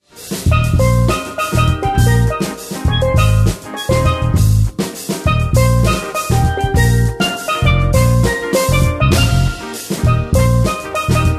Steel Drum Band; Caribbean Band

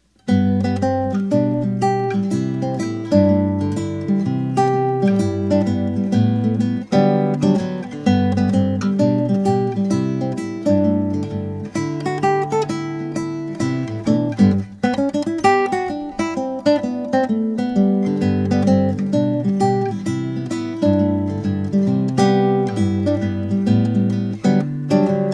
classical acoustic guitar

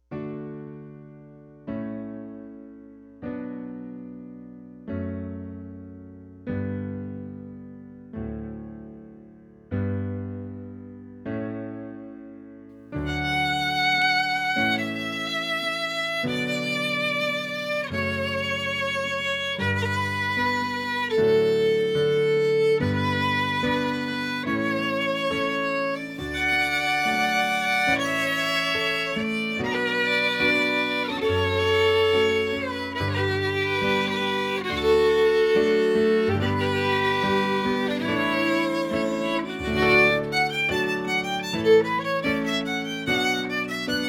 Canon in D, piano

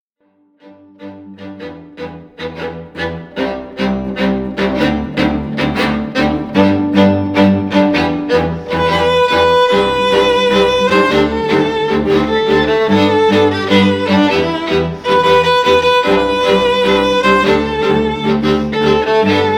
Rock Quartet, Classical String Quartet

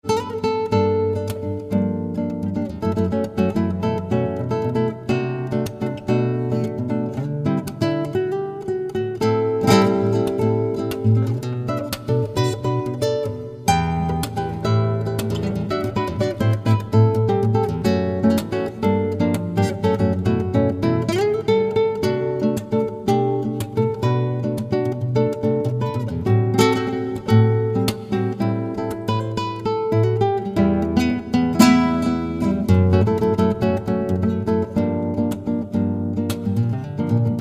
Cocktail Hour Guitar; Flamenco Brazilian Classical Guitarist

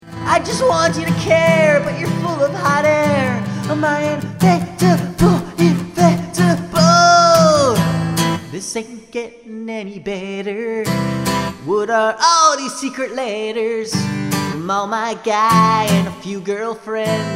Acoustic Guitar

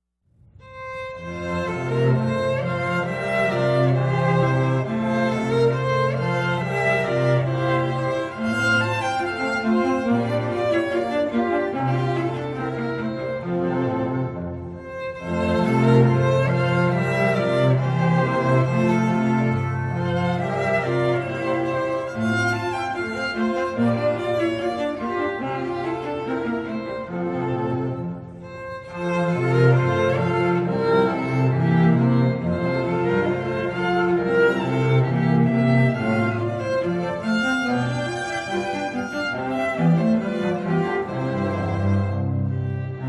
Bach Arie, String Quartet; Classical; Heritage String Quartet

